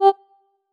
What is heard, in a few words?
marimba; ゆめ2っき